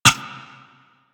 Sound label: Ricochet; Warframe; Cowgirl